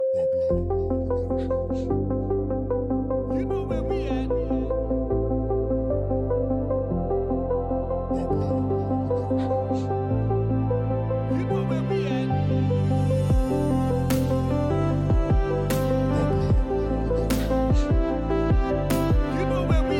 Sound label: POP EDM